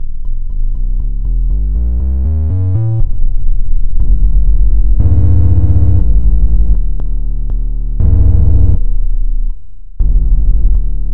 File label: Sub Level, Soundfont; Onj's Soundfont